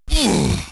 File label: Grunt; GBA; Donkey Kong